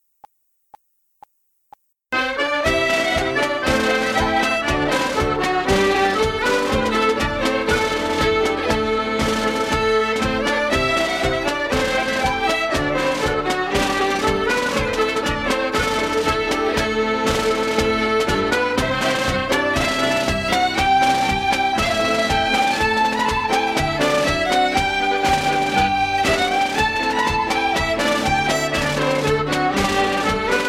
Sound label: Irish Ceili Marches Medley Am; backing track